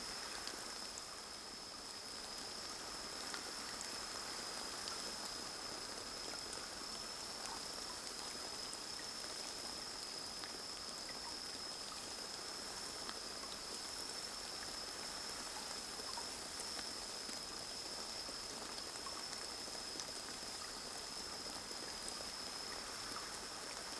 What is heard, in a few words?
ambience field night calm drizzle; Rust